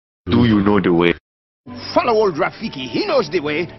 pingpong, hip hop ringtone

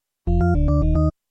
underworld sms; smb

